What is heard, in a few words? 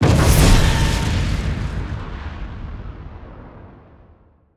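explosion; Se Solo Bakuhatsu 01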